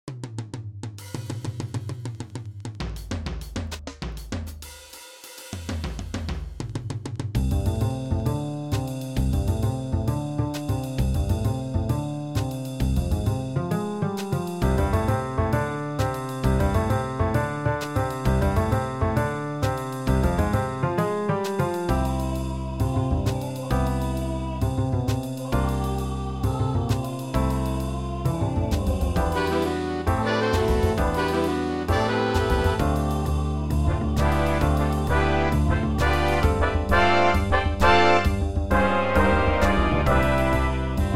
Big Band score

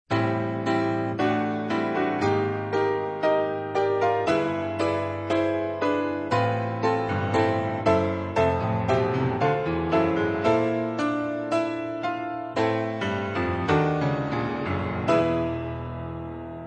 piano; 1st movement

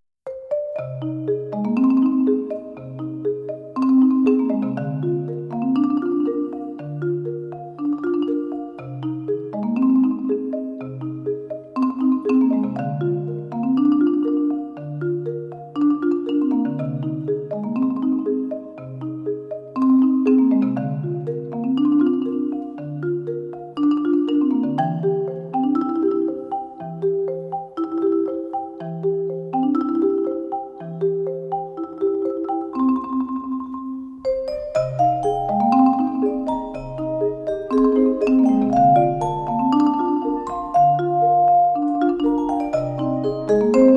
Marimba Floating Feel

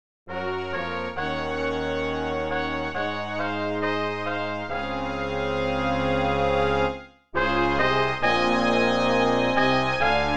Love Theme brass quintet

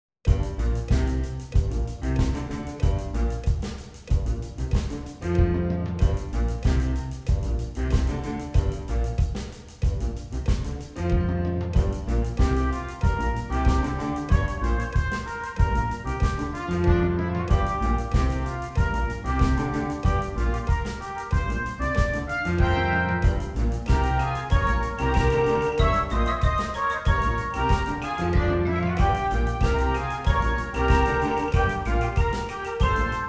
Odd Funk

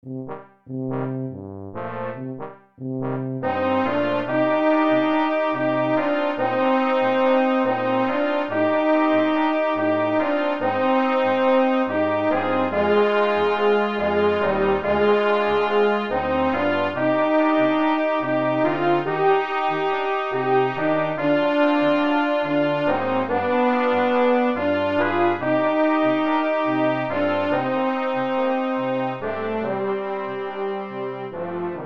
A Theme in 5-4 brass quintet

